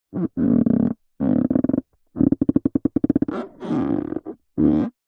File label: foley balloon partially inflated handling creaks squeaks